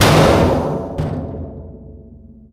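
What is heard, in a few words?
panels impact large, metal; physics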